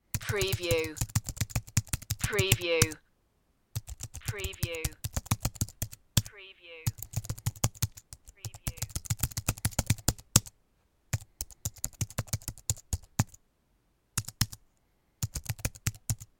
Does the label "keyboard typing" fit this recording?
Yes